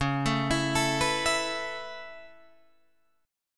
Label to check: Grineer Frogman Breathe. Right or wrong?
Wrong